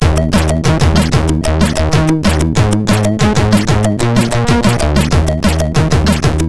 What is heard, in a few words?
Bass